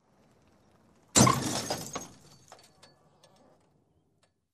Car Crash with Broken Glass Sound

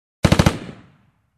Short machine gun shooting

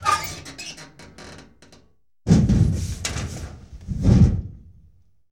Body Fall From Locker

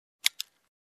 Stopwatch click

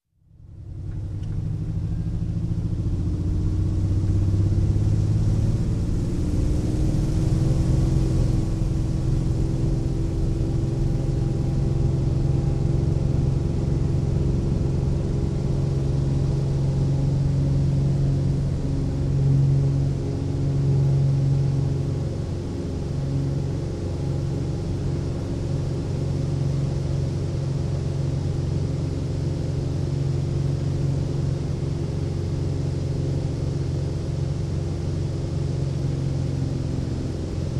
Propeller Aircraft Take-off from cockpit

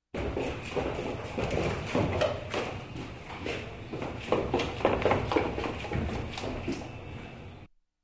People walking down stairs

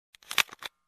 Camera Shutter Sound

